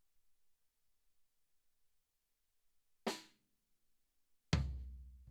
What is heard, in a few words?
Floor